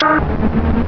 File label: train use